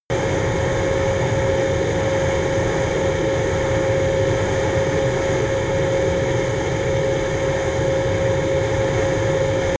plane cessna private turbine idle close; flugzeuge verkehrsflugzeuge; verkehr transport

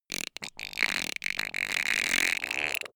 creature quack

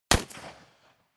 rifle ak-47 exterior fire single clean; comedy-cartoon; Pistols and guns; pistolen-gewehre

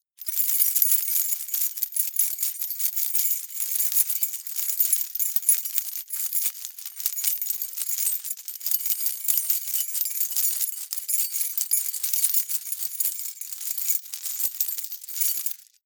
key ring large rattle air sequence hard; objekte; schluessel

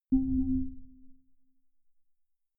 button open big sine; signale

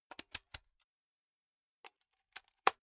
insert safety plug; objekte; elektronik

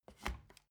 step ladder wood scrape single

